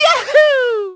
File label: jump "yahoo"; Mario; Super Mario Sunshine